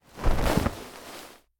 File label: Jump Into Bed; LOOPERS